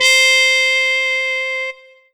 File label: Roland SC-88 Muted Trumpet C5